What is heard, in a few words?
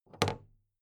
big plastic case handle bounce regular; objekte; kiste